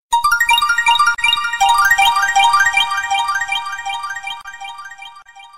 iphone sms tones ringtone

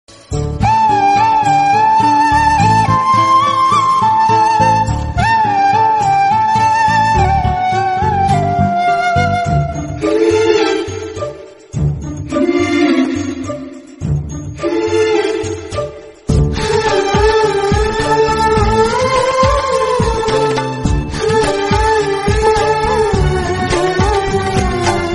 BGM RINGTONE

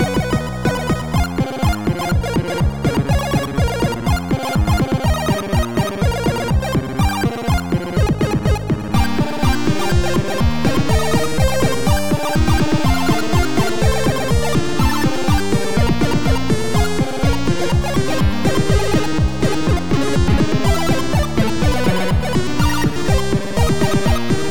KEYGEN MUSIC RINGTONE; WORMS ARMAGEDDON

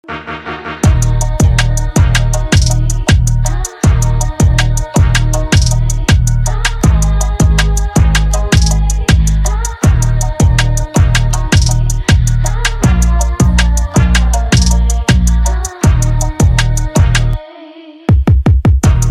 instrumental ringtone

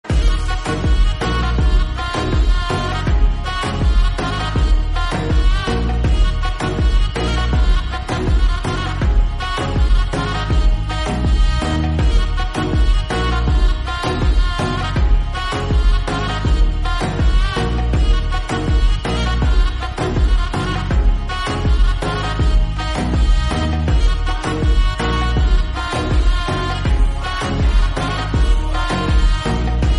SLOWED REVERB RINGTONE

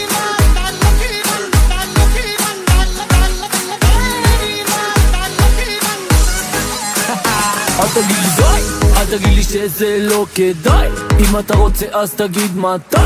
Arabic ringtone

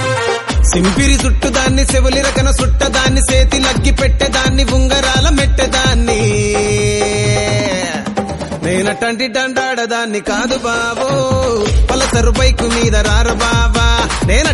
telugu ringtone; RAVI TEJA

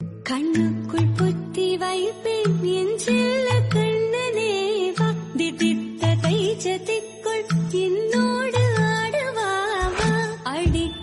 KRISHNA JAYANTHI SONG RINGTONE

